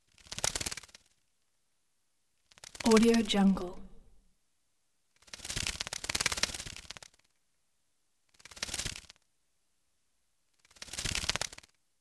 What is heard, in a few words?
wing flaps giant rapid large flying bug